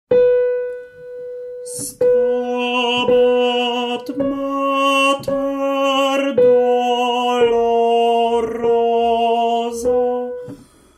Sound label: TENOR; Stabat Mater